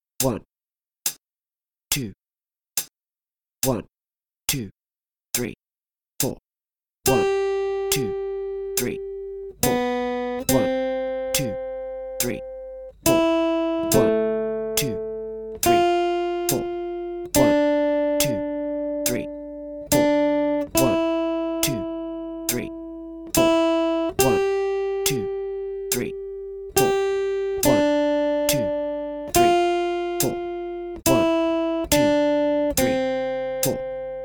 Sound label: 3 strings, guitar